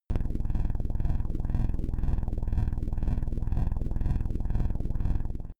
space inside biomedical alien shuttle low pumping

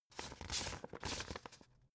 old examine

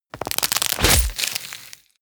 tear out bones fleshy

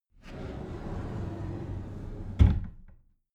cupboard slide door close soft

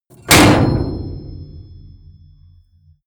door ringing

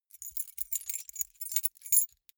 key ring small rattle hard

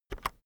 keyboard external enter press fast soft

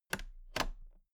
toolbox latches open regular double

